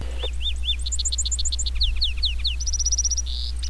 lark sparrow

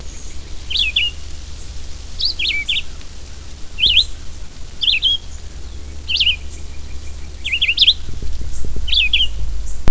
red eyed vireo

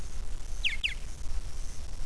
purple martin call

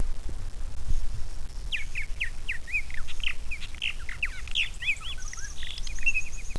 purple martin song

